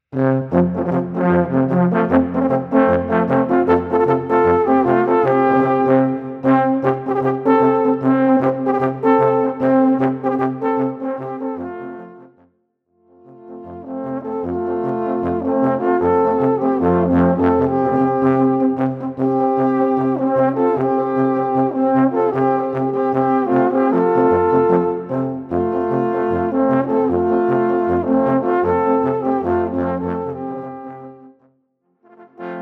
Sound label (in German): Alphorn